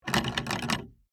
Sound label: Door Woodshed, Foley, Door, Woodshed, Latch, Shake, Handle, Metal; Barn Door